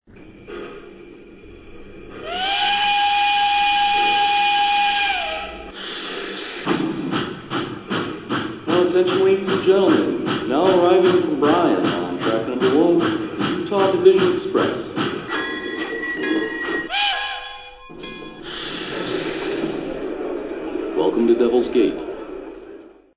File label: 440 Gen, electric trains